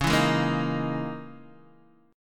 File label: Db Minor 6th Add 9th Db down Guitar DADGAD